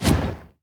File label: wrath flame; veznan